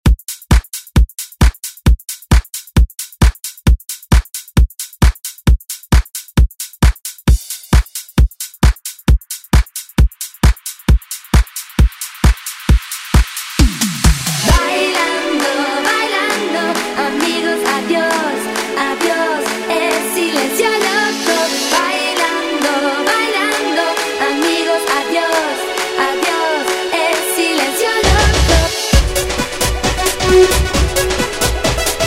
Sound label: Oldschool Redrum; Deejay Hit